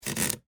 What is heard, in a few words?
Typewriter Scroll, Technology, Typewriter, Smith, Corona, Scroll, Paper; Typing; BlastWave